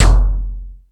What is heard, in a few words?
More Toms, Drum Machines